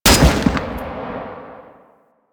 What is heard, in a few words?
Gun, Weapons; Jackal